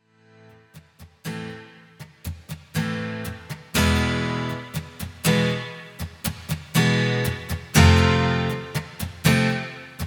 instrumental acoustic guitar

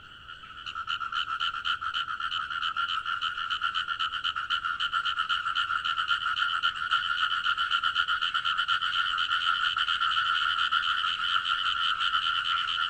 Frogs At Night

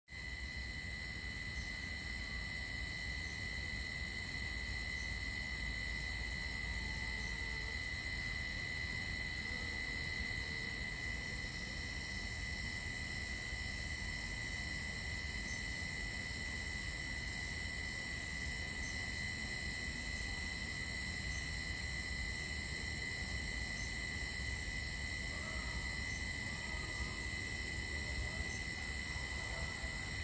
jungle night, Forest Night Ambience

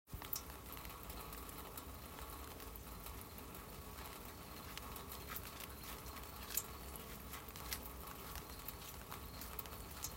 soft rain with crickets and forest stream; Forest Night Ambience

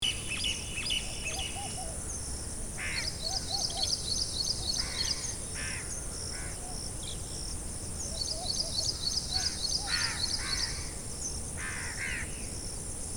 crickets and bugs sound at night; Forest Night Ambience

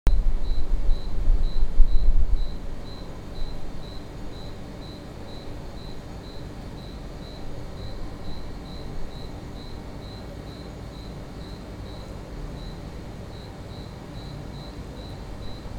night cricket grasshopper balcony raw; Forest Night Ambience

